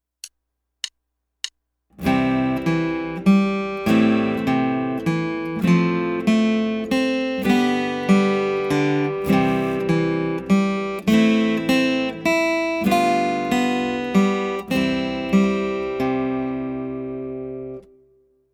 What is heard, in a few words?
Chord Waltz